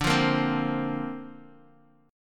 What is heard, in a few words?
D-Minor 7th Sharp 5th D Bass Standard, chord; Dm7#5; strummed